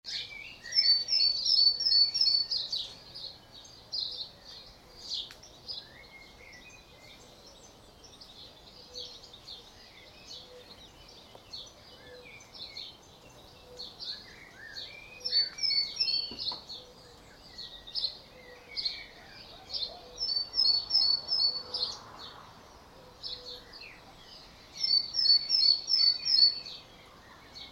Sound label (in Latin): Parus major; SETE